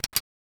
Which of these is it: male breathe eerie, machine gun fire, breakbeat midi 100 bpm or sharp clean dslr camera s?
sharp clean dslr camera s